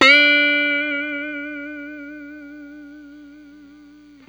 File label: BLU BEND UP, Guitar & Bass